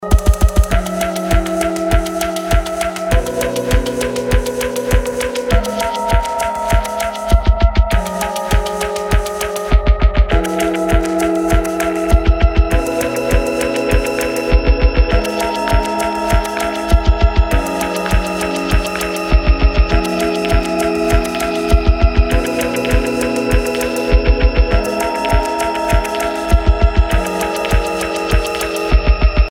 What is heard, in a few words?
Moonlight Recorder